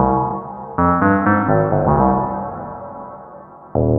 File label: Bass Stabs Flourish